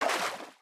water, step

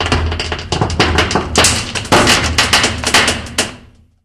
creak, ambient

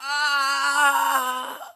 killed; monsters; stalker; death

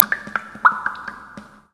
drop, underground; ambient

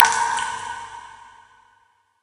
drop in pool, ambient

